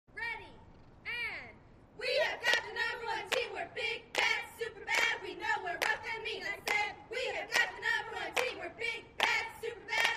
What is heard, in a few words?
Cheerleaders Cheer